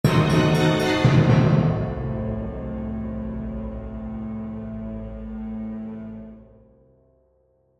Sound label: Accent Orchestral, Accent Orchestral Dramatic; Glockenspiel; Suspenseful; Blastwave